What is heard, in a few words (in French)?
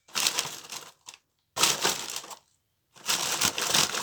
Bruitages Froissements papier journal